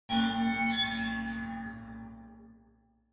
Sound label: Horror Accent